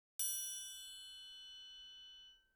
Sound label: triangle; サンプリング素材(パーカッション)